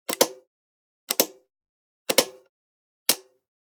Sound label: Generic Button Metal Medium; boom library; The Interface